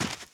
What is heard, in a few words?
gravel, footsteps, run; boomer